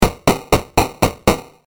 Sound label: stairs